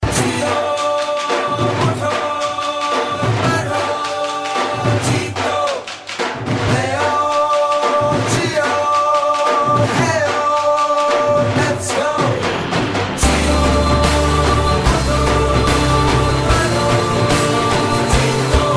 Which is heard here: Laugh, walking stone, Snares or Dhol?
Dhol